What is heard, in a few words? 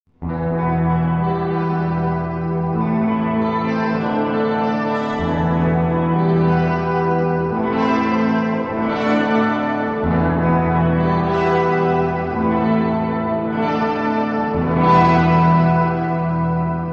Shimmer Reverb